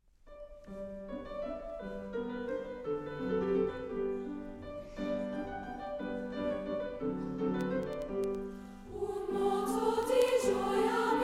Beginning Womens Chorus; Leon HS

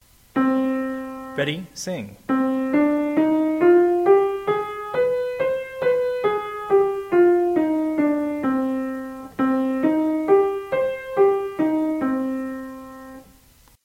C minor louder

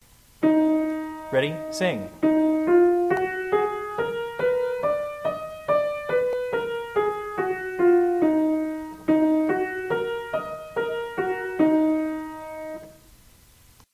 Eb minor louder

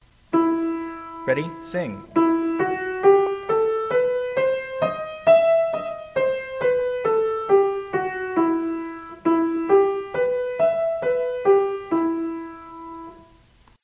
E minor louder

